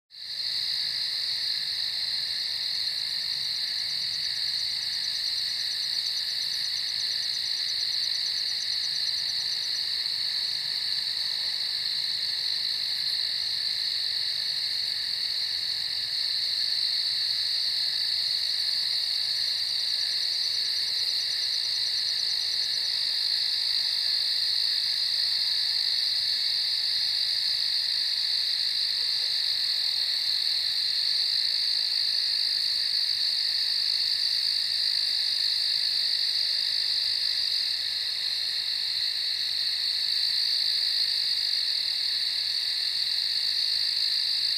Field at night with Crickets

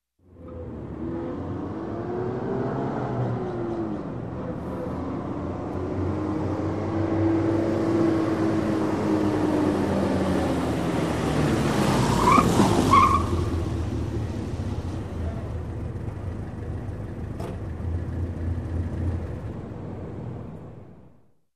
Truck hard brake

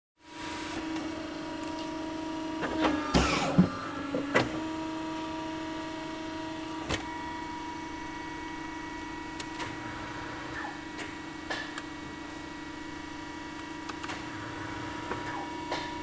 Electronic Industrial Machine running

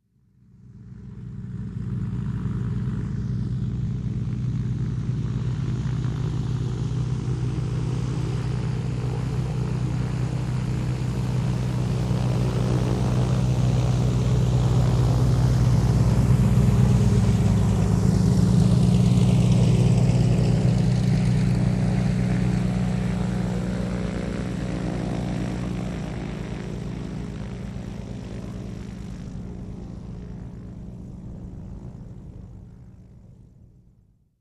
Propeller Aircraft taxi passing by

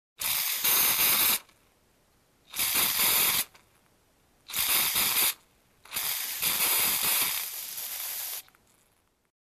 Artificial snow spray sound

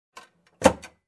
Compartment lock click sound

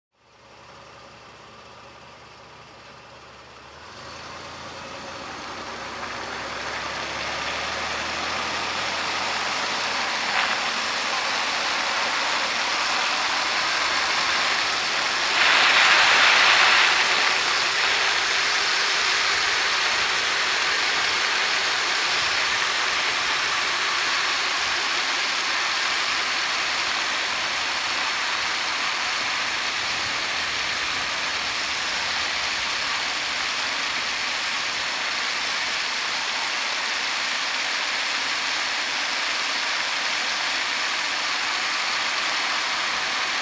Driving on Wet Road

